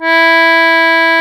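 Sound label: CONCERTINA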